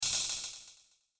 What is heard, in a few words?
button burst; Multimedia; Buttons